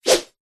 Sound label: sport tennis racket swing swoosh whoosh air